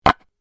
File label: Small wooden box container close